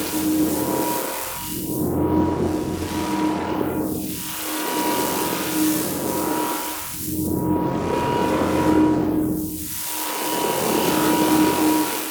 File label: Dead End Crystal Loop; Echoes; Warframe; Entrati